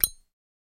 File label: Zippo Lighter Open; lighters & matches